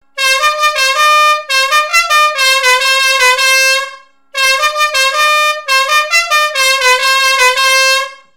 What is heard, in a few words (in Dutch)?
luchthoorn Metal 12V; claxons; tuning